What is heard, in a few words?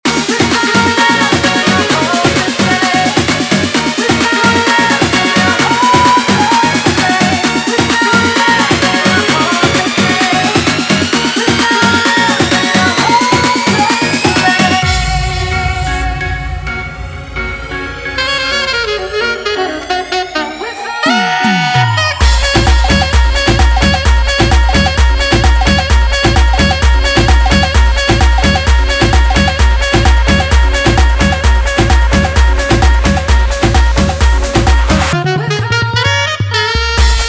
guaracha remix 130 bpm